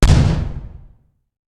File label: Explosion